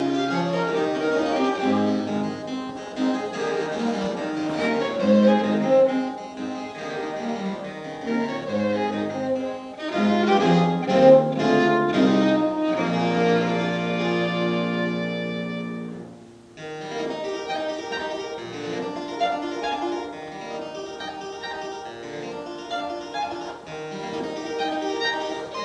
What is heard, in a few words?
baroque chamber music concert violin viola da gamba harpsichord trio; in perpignan